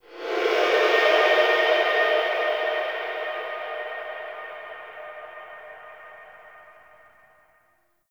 Cargo Hold Reaper Call; Wraith Quest Cargo Hold; Warframe; Cinematics; Call Of The Tempestarii

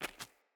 Walk Stone, Footsteps; Characters; Mother

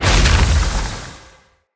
Lightning Slam Impact; Characters; Enemy; Abilities; Sinecure; Banneret

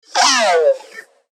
Repair Drone Death; Necramech; Battle Barks

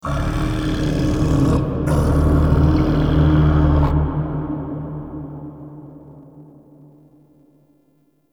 Shouts; Warframe; Man In The Wall; Entrati

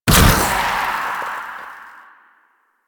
Contagion Cast Burst; Warframe; Saryn

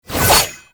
Stab Attack; Enemies; Abilities; Warframe; Tau; Dax Ninja

